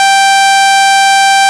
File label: sawtooth